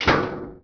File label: switch